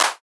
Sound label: Clap